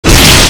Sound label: hit